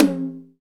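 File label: PER PROCU, Procussion, percussion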